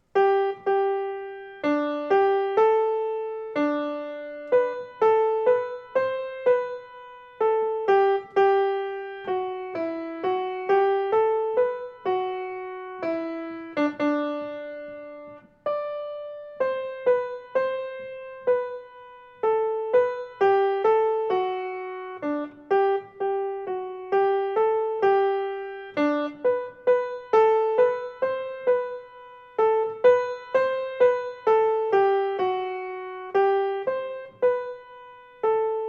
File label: MELODY; Adeste Fideles